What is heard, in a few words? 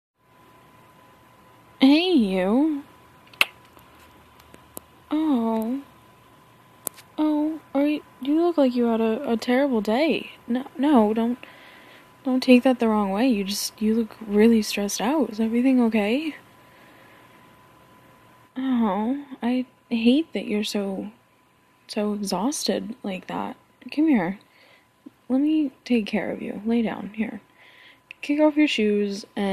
wet noises, playful, kind of silly, F4M; Being playful after a long day at work